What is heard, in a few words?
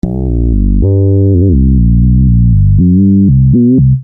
Syn Bass 120 Gm, Techno Synth Loops; SynBs; 120bpm; Sonic Foundry (Sony Creative Software); Signals I